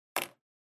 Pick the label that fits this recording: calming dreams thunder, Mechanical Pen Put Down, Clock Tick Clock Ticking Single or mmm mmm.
Mechanical Pen Put Down